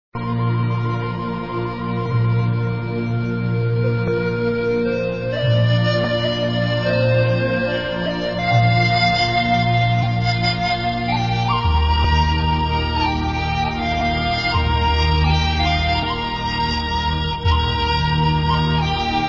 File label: namo flute